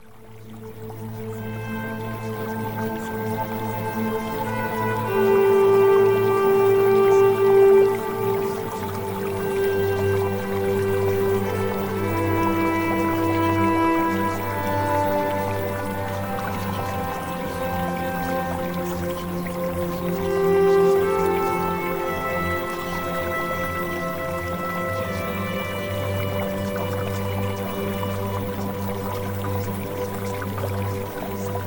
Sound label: Music Sample; OVERCOME ADDICTION; Premium Subliminals